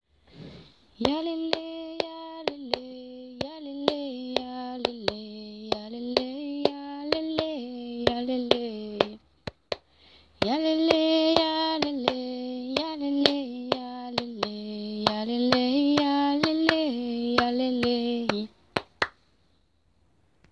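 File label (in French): Voix principale percussion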